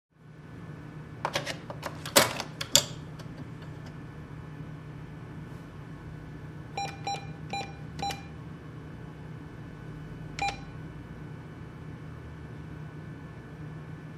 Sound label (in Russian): zvuk bankomata